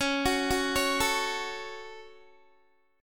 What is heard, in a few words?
Db Suspended 4th Sharp 5th Guitar 4F,4A,5C,5G,5C,6E; arpeggiated; 20,21,18,18,21,x